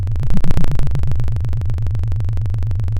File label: Sound of a plane taking off